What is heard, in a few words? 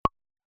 click